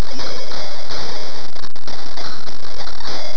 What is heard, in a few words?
RAIN